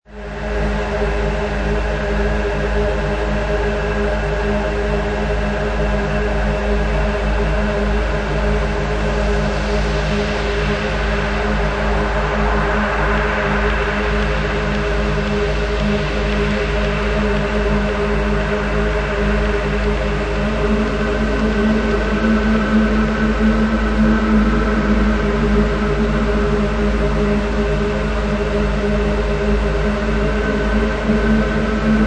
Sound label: Soundscape